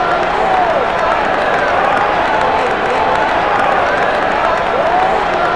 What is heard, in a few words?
crowd ambient; Totaled; XBOX; crowds